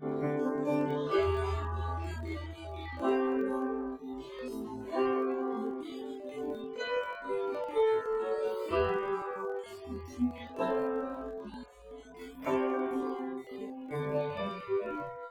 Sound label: piano; sound source separation methods